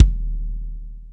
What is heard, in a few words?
BD, Bassdrums, Roland TD-20